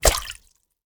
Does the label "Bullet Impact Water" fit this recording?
Yes